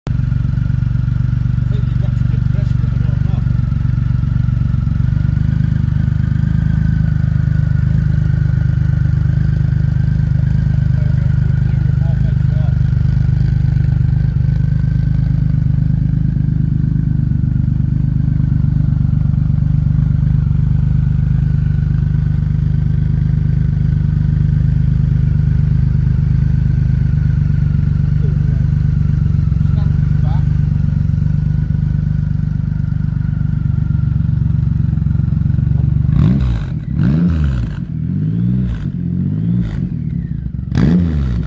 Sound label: exhausts